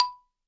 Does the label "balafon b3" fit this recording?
Yes